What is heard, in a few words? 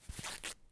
medkit end; item salewa; Escape from Tarkov